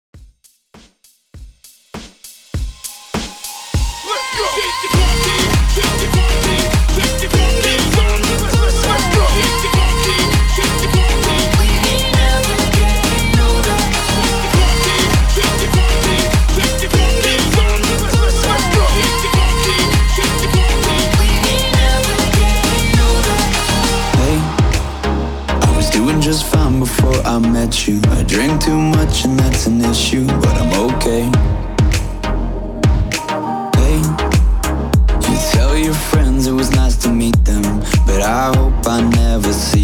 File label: PARTY BREAK 100 BPM